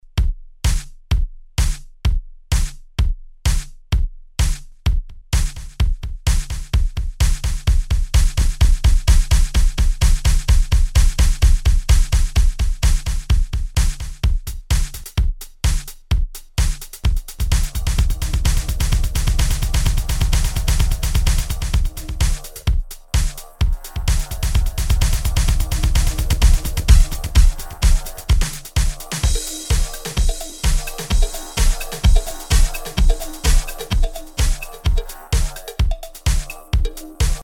Echo

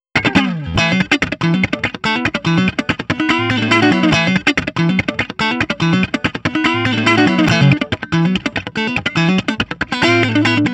Funky Riff

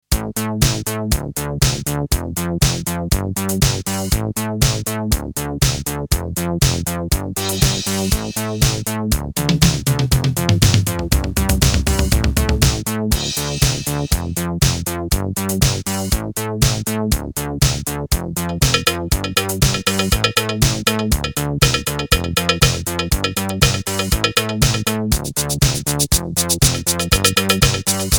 Technopop

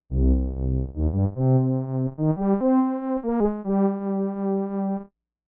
Kobol Brass Vibrato

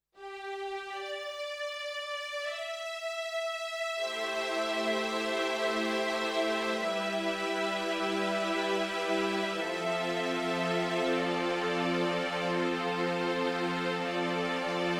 Kross Strings

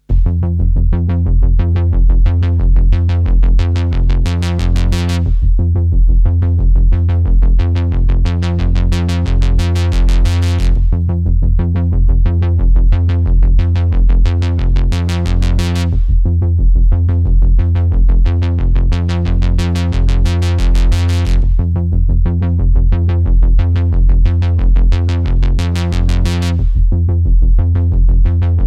S100 122 Stranger Bass

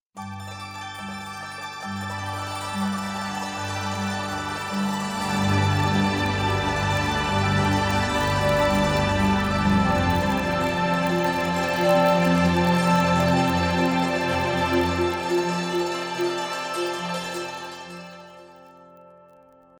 sparkle accelerating particles; NI arkhis

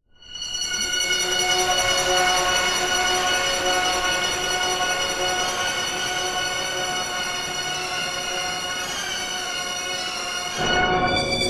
Sequential Prophet X Ghostwave

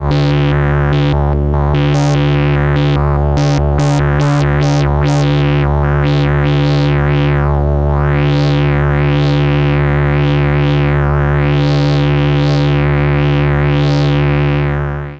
SH Noise Glide min max; GRP Module

